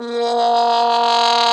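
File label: Plunger Mute, Brass; Roland L-CD702; Plunger & Wa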